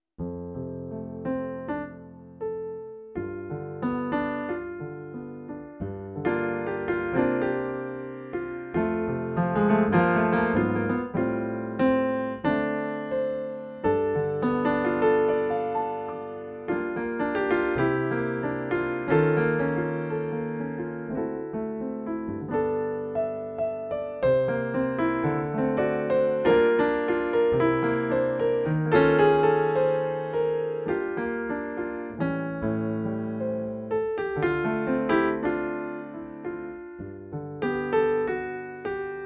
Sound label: pianoteq steinway; keyboard transitions